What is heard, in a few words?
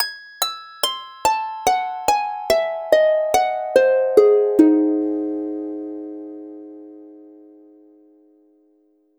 motif; alces